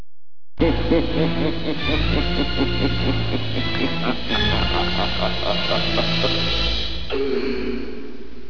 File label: cackle